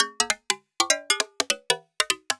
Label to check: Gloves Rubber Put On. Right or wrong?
Wrong